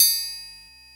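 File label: OPEN TRIANGLE; Greytsounds VOL-1; LATIN PERC 2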